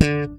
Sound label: SLP POP D, PEDULLA BASS; Masterbits CLIMAX 7 - Bass